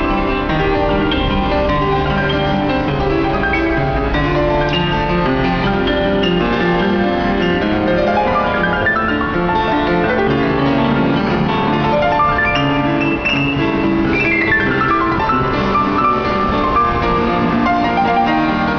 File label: nice piano